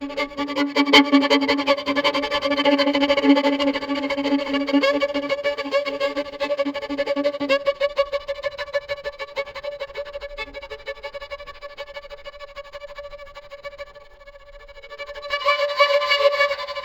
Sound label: scary violin stabs; instruments